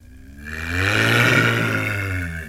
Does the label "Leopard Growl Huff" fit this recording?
Yes